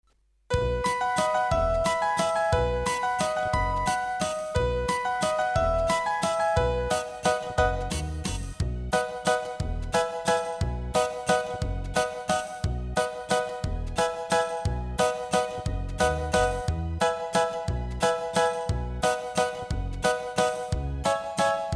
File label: Instrumental, karaoke, Bulgarian; Pchelichka; Bulgarian Folk Songs; Children